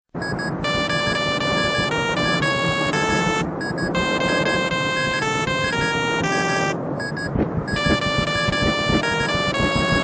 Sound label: horror; Luigis Mansion